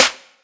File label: Clap